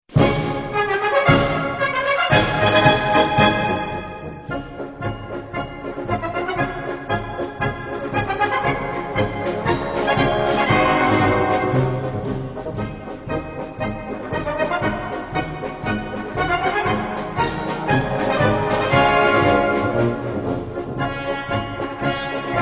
March Marsch; Waffen SS Farbe; Alte Kameraden; Koniggratzer; German march

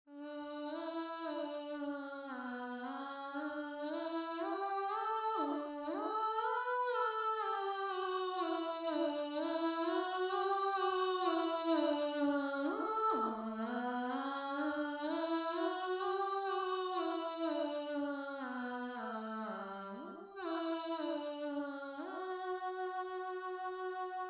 Alto

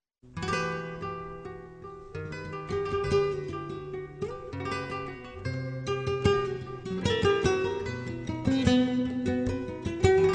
Flamenco Dance; Spain; A genre of Spanish music, song, and dance from Andalusia, in southern Spain

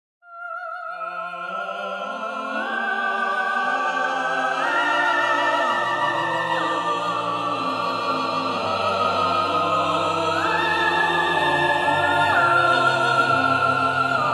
counterpoint; Artinfuser Counterpoint

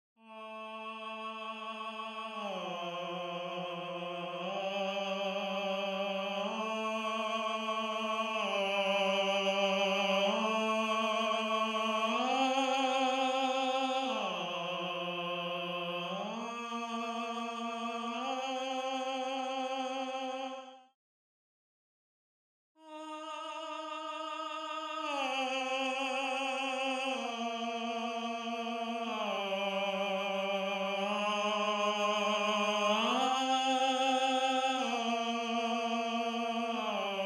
Tenor

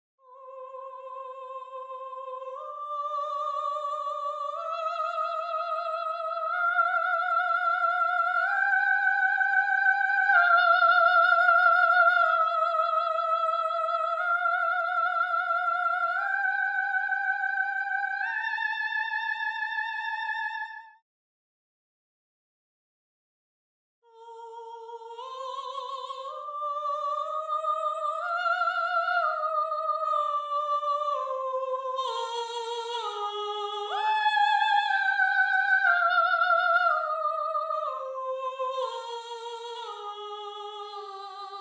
Soprano, counterpoint

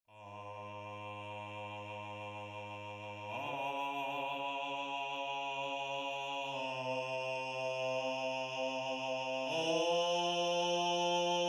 Bass, counterpoint

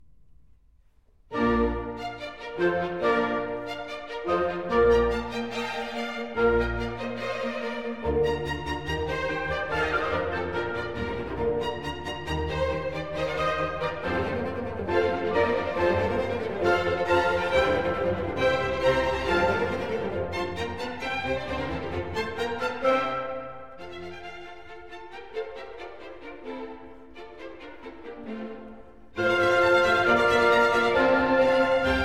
Cassation in B flat; Mozart

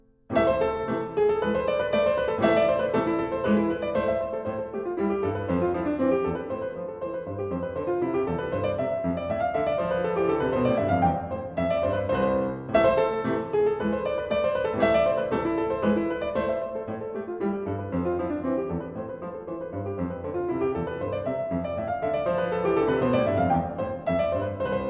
Partita in a-moll - Scherzo; Bach; BWV 827

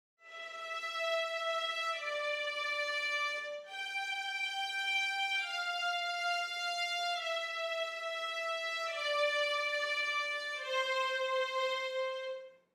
Violins; Exercise 1 10

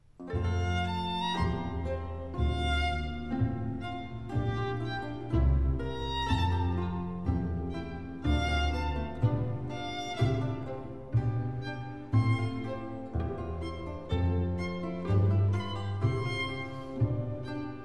Concerto in Fa maggiore - Andante; Vivaldi; Concerto ripieno

